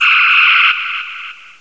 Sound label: phas shot; cdromtoday; startrek